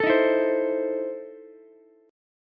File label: E Major 7th Suspended 4th Sharp 5th Ukulele; EM7sus4#5 strummed